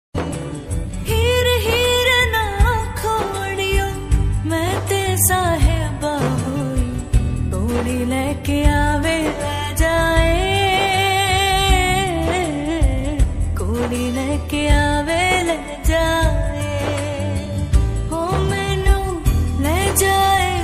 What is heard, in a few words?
hindi ringtones